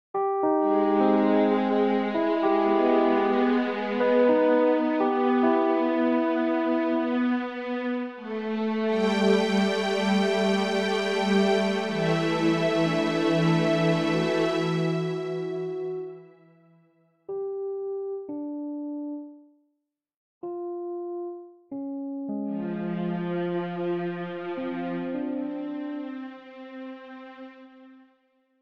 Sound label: orchestra